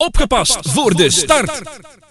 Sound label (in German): Jingels